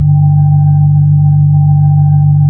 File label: B-3 Chorus